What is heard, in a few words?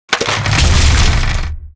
objects, World of Tanks